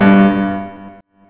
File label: PIANO ECHO; Big Beat Samples & More; AKAI; KEYBOARD KIT